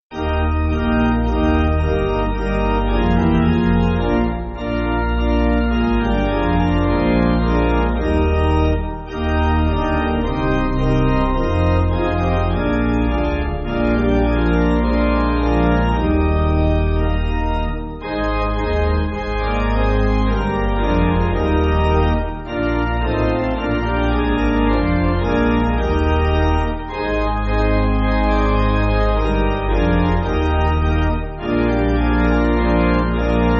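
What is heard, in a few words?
Pipe Organ, Music Backing; Gladness Gladness; E. Gebhardt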